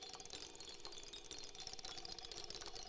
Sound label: reel spin; netent